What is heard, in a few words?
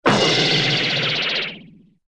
bowling ball; dontWhackThePack